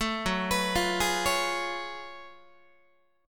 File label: Gb Minor Major 11th Gb Guitar; arpeggiated; JGuitar